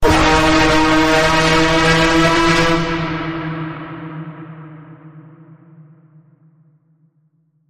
Full Bend Ahead, D sharp

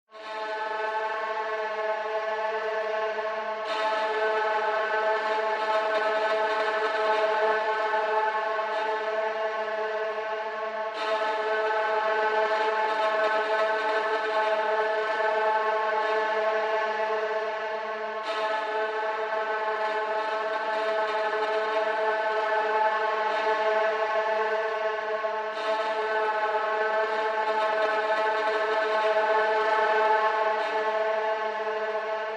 Clattering Only

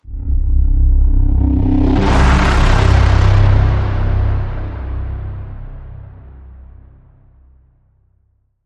Bend, C

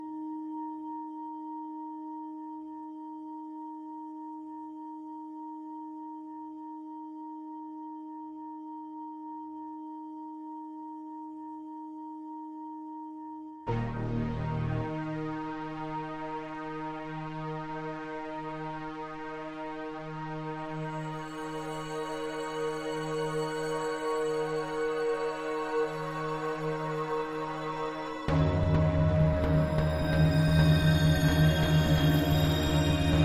Menace Drone Light

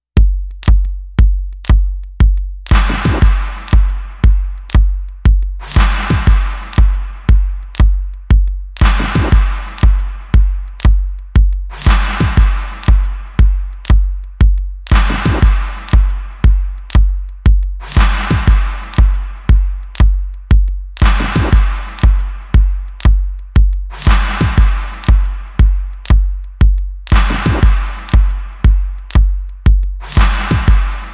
Adagio In G Minor Albinoni Remix, Drums

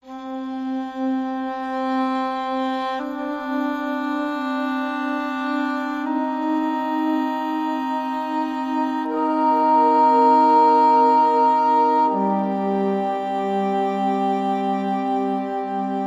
Woodwinds & Percussion

